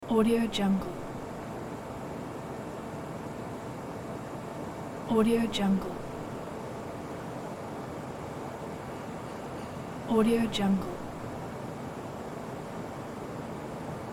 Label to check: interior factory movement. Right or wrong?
Right